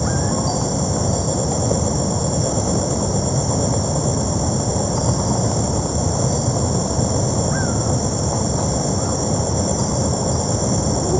Enviornmental; Sonic Foundry (Sony Creative Software); Ugly Remnants VOL-2; UR 2 FX (Long)

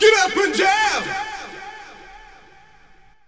VOX SUNG; AMG Megabass REMIX! VOL-2

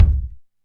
DM5 Kick, Alesis DM5 drum machine

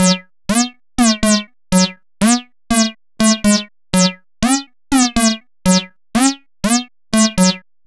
303 Lead; Sonic Foundry (Sony Creative Software); Club Joints and Street Anthems; Razor Edge

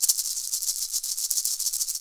PUILI, HAWAII PERC

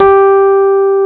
G 3, Electric Pianos; Wizoo

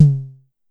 Drum X Tom; Pearl Drum-X

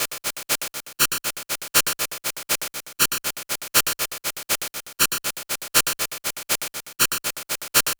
Anabeat Hat; Beats; Sonic Foundry (Sony Creative Software); Prototechno

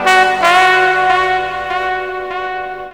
TROMBON FX; Ragga & Dub Reggae; BRASS KIT; AKAI; USB Soundscan vol.27

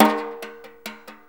6-8 Brush, Perc Loops; Drum Tools

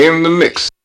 RAVE

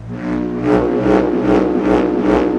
SPIRIT CATCHER

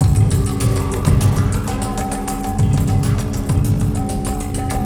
Ambi Groove

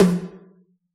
pop hi tom; Giga Samples Collection; Dr 202 Kit 1

